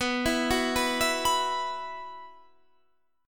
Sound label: B Suspended 4th, Guitar, Standard; 19,19,16,16,17,19; arpeggiated; JGuitar